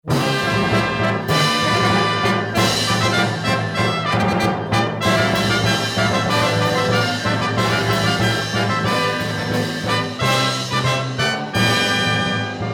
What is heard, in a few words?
presentation fanfare